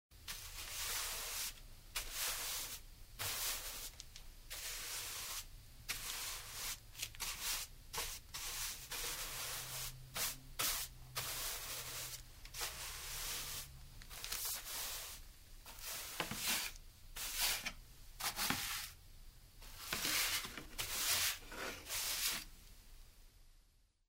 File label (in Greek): Hxoi Skoypisma Me Skoypa